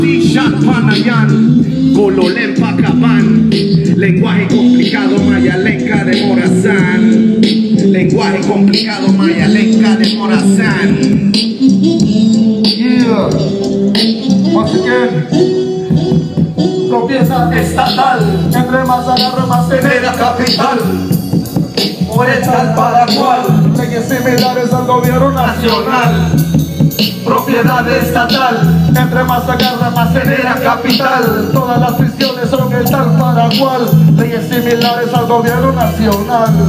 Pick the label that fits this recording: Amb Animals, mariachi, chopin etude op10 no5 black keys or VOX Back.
mariachi